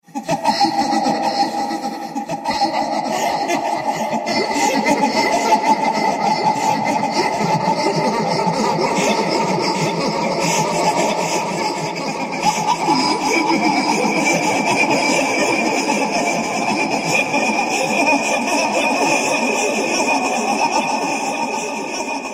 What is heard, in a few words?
Horror Crazy Laughter; Laughter Sound Effects